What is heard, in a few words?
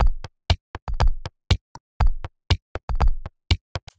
Wood Drip Kit; drum racks; Ableton; Factory Packs; Mood Reel